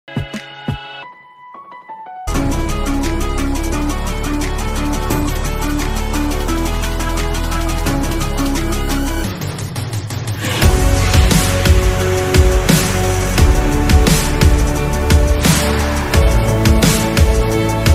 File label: instrumental ringtone remix ringtone